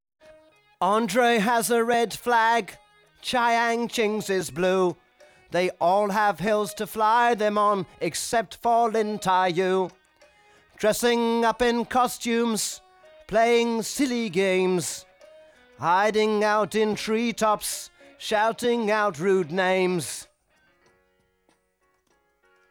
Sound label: Main Vocal Verse